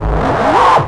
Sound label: FX Scratching; Vengeance Samples